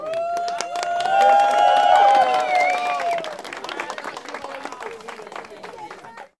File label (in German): Applaus Sound